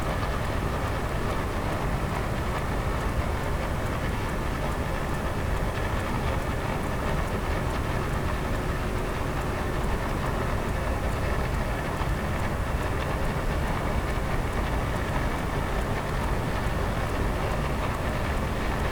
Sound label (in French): cachalot negatif, Physeter Macrocephalus